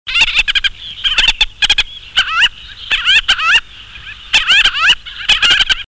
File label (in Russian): chaykonosaya krachka golos